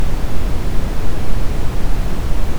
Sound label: bleep sound effect